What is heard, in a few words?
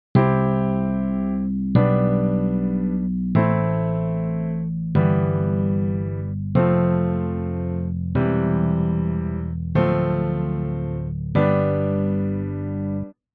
bass